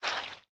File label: raw fish inventory move; factorio 2.0.55